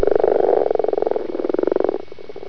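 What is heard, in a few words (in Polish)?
rana temporaria, plazy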